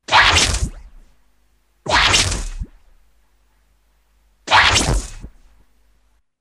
Sting, horror sounds; Hollywood Edge; The Triffids

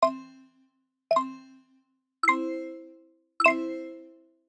Digital Click Positive

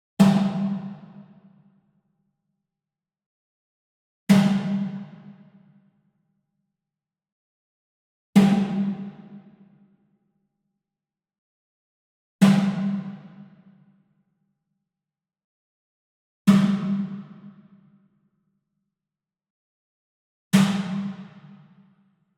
WOOD PERCUSSION Wood Drum Mounted Hit Whip Far; boom library; Cinematic Strikes Construction Kit

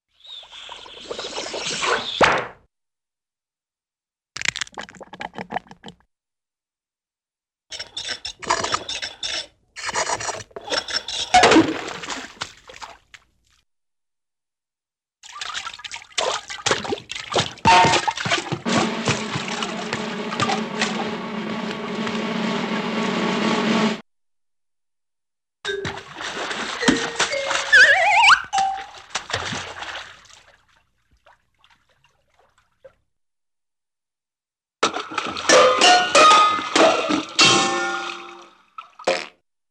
Bubbles Splashing, Cartoon; Hollywood Edge; Cartoon Trax

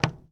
Plane P40 Step On Wing; SFX Kit; Airplanes

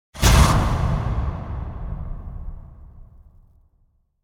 Impact Whoosh Airy Medium; Hollywood Edge; sound designer's toolkit v2

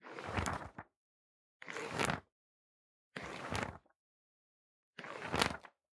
Organic Paper Brush Pages; boom library; The Interface

